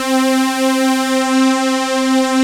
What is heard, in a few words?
VS strings; Greytsounds; Scott Peers Sound Engineering